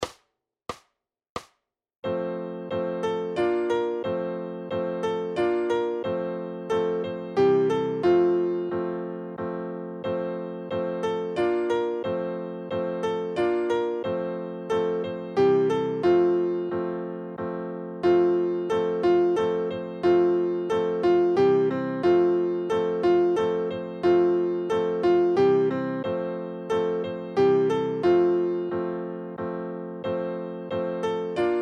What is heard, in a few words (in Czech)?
SuperKlavírKoledy; Nesem vám noviny; Super Snadný Klavír: Koledy pro samouky a začátečníky